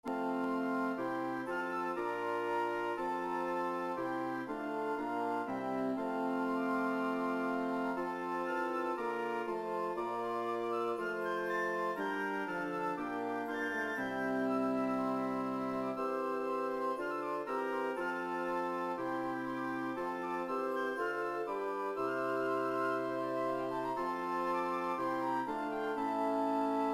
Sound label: Hymn Wind